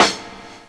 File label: rimshot, Ragga & Dub Reggae; USB Soundscan vol.27; AKAI